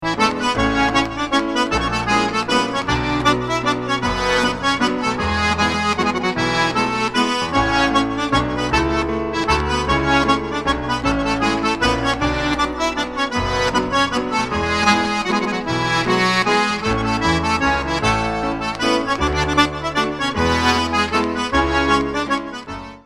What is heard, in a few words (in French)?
valse